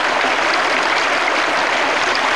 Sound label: stream; Mafie 1